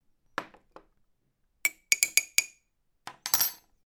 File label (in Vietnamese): Âm thanh khuấy thìa cà phê